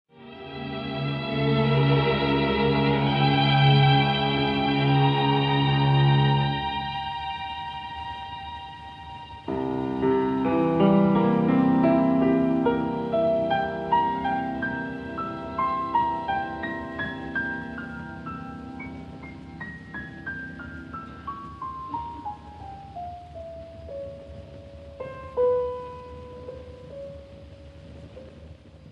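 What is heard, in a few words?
Konzertstück for piano & orchestra in F minor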